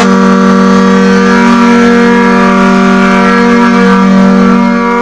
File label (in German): doppelhorn